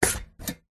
vehicles bicycle pushbike front wheel brake engage; zapsplat